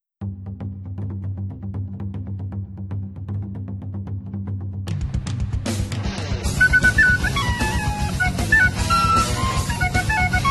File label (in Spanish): Flauta Desafinada; Naruto